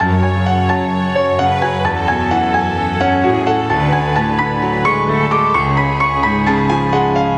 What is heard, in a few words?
loop